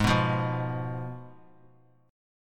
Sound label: Ab Minor 6th Ab, down, 3C, 3F, 3A, 3D; Abm6 strummed; JGuitar